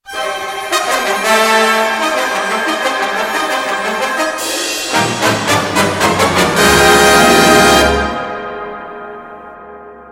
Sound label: dramatic cues